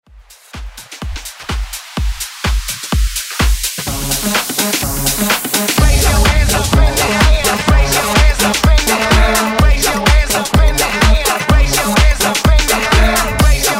Hype Bootleg Rework 126 Bpm

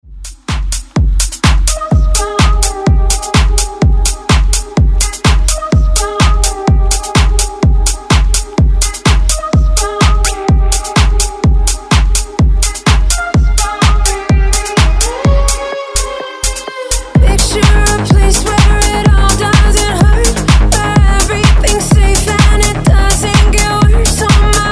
house redrum

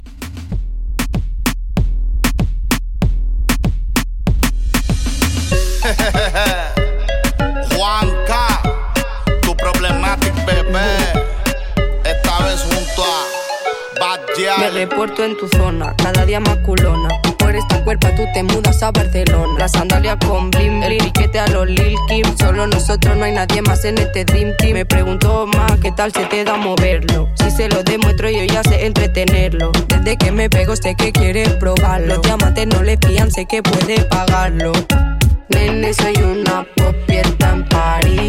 Reggaeton 96Bpm

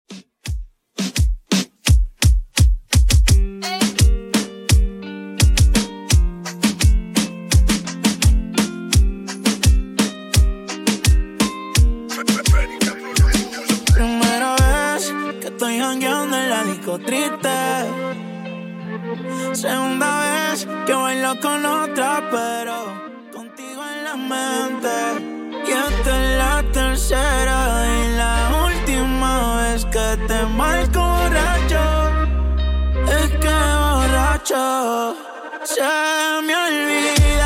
Top40 Reggaeton Redrum 85bpm

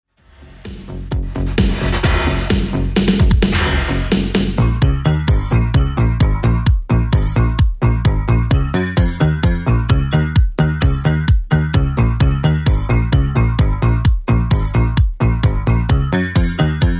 80s Xtend Redrum 130 bpm CLEAN

